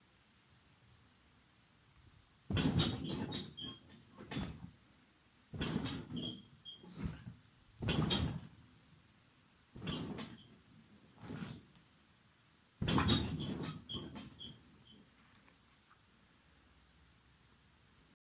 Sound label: Bed Squeaking